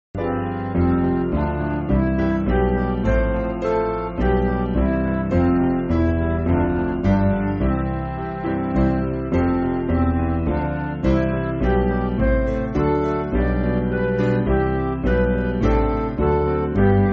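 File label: Midi, Backing Files for Churches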